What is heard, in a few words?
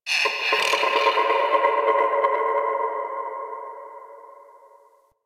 Landscape Cave Creature, Ambience; Warframe; Venus Open World; One Offs